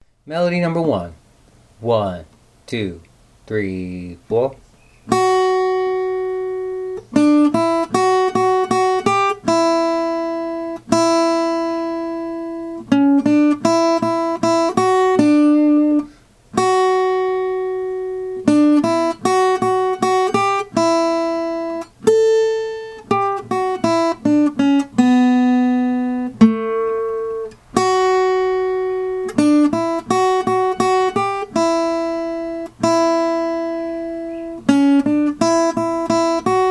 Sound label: melody